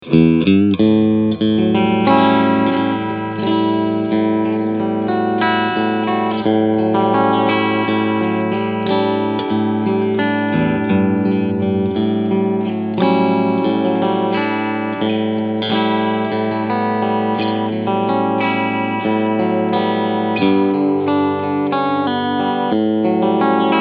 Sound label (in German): ESP LTD SN 1000 Cleansound Steg mittlerer